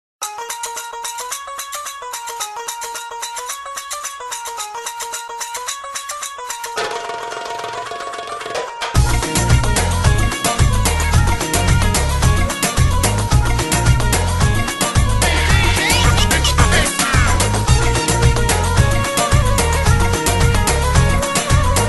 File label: crazy tune, hip hop ringtone